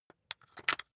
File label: rock drill head attach heavy; maschinen; bohrer; soundpool